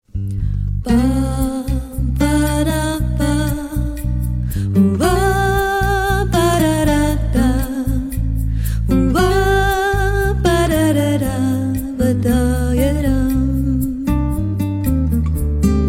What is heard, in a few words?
Lithuanian Mother sample